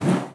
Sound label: BOW PERC, BASS FINGER; Xsample VOL-5